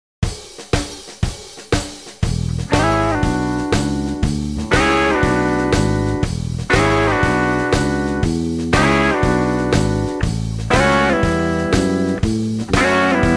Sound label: Rhythm Guitar